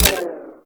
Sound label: Rifle Dry Fire; Warframe; Weapons; Wisp Rifle